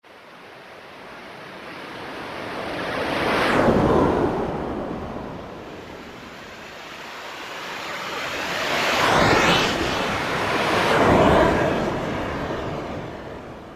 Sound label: Passing Sky, Roland PN-D50-04